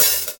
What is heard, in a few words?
clean open hi hat sample a key